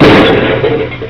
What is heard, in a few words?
car crash; Game Maker5